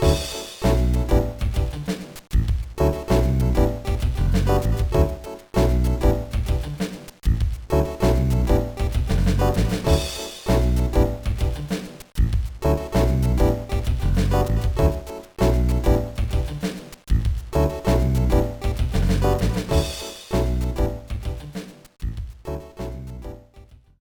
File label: BGM; Isseki Hacchou Kore 1 Pon de 8 Shurui; GBA